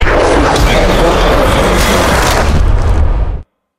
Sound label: stalker jumpscare sound; smiler